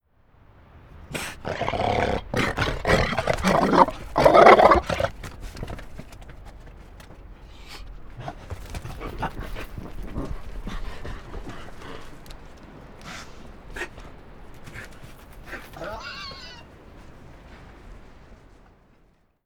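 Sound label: NZ fur seal in air Growl with Gutteral threat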